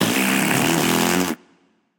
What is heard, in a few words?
tongue; Smite; bacchus